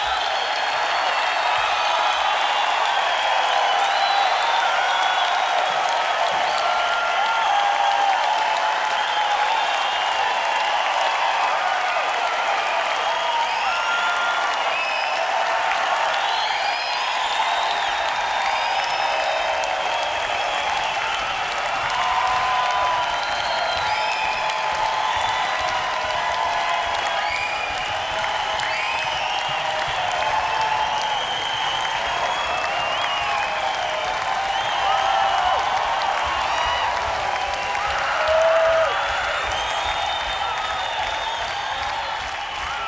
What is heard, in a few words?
Encore Break